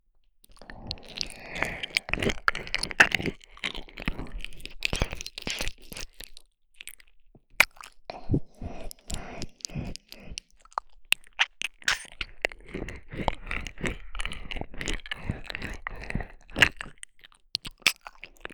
耳舐めのみ（右耳）, ASMR

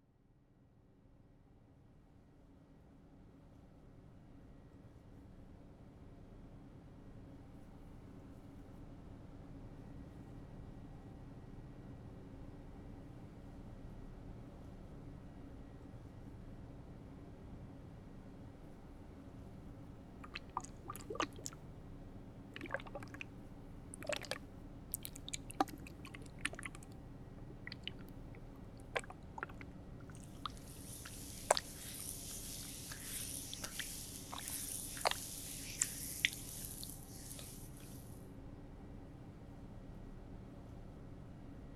左耳掻きと、顔かっさ（凡天と耳掻きの正統派耳掻き。かっさマッサージ）

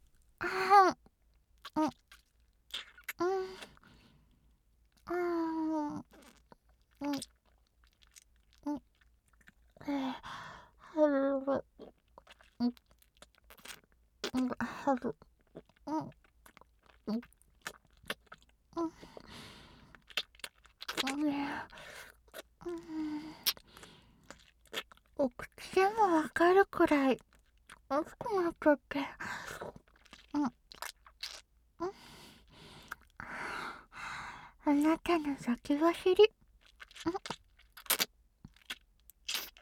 たっぷりねっとりフェラチオ

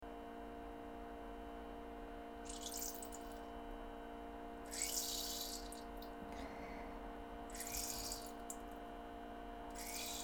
小分けしょろしょろおしっこ; 生撮り！; 盗聴; 吐息あり

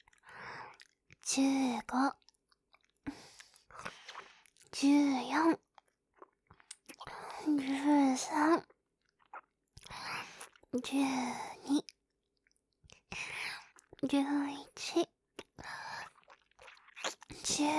カウントダウンボイス4フェラ

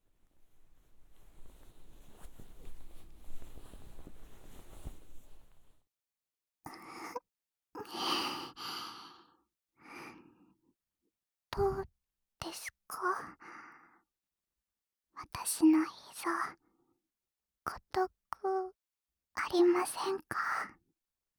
お耳のお掃除; まずはお耳のお掃除から……。

